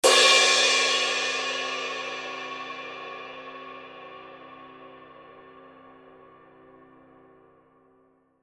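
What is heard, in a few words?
signature 20 duo ride edge, cymbales; Forgées à partir d’un alliage secret de bronze; paiste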